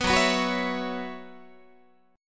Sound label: Gb 7th Suspended 4th Gb down Guitar, chord